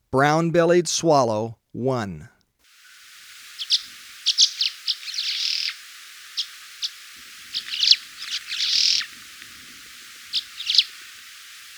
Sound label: Brown-Bellied Swallow; S. America